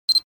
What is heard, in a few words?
Digital camera beep blip autofocus